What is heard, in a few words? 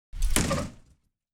urban debris plastic little object passby